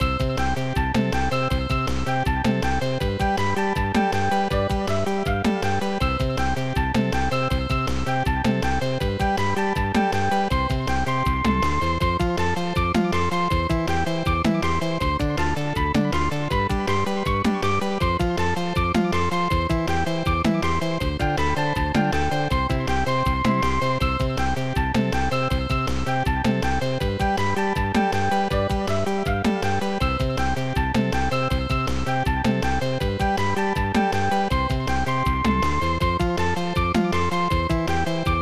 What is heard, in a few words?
Stage Music; block kuzushi; snes